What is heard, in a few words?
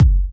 Kicks, Elektron MachineDrum SPS1 MKII